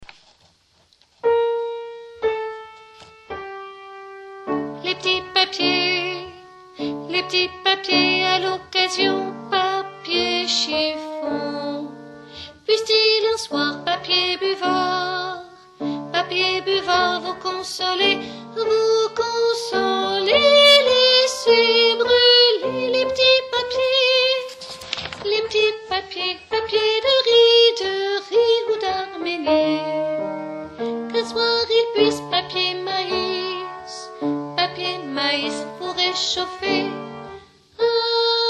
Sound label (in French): basses